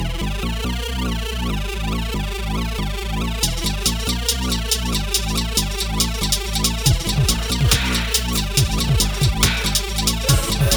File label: sci fi song, Honestly, it sounds more robotic than space type sci; Umplix